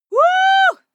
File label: female cheer woo; schreie; menschen